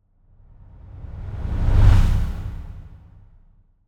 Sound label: Whoosh Deep Air Whoosh; Hollywood Edge; sound designer's toolkit v2